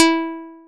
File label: plucked, programmable speaker